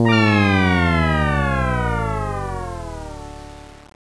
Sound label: lose level; svencoop; scxpm